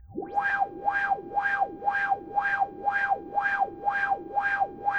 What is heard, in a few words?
sound laser; singe; spacerocks